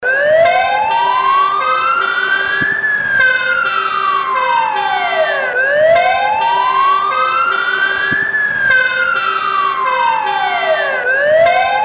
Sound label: fire; siren